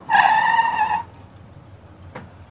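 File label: Cadillac Skid To Stop; Cadillac 1972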